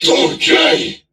Surprised

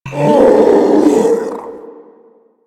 Infested Ancient Strike Scream; Warframe

